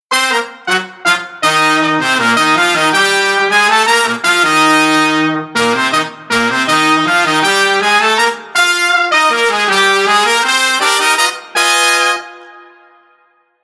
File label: Horn Mix Bass On